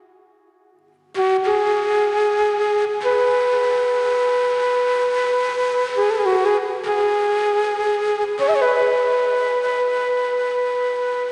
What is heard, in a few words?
Mystical Bells